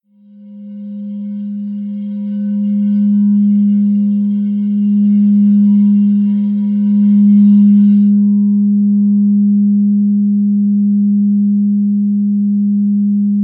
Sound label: 12 g note 432hz perfect pitch throat chakra bowl; truth